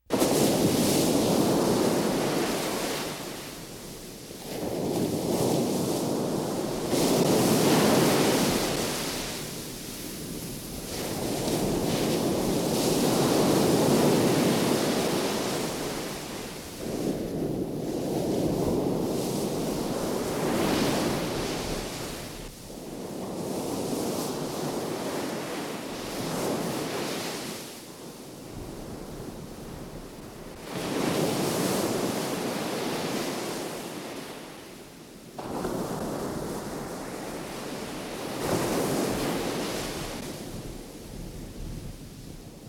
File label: Ocean Music, drums and nature